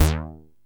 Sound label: TEISCO, BASSES